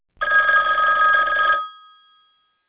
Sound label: Classic Home, Business Rings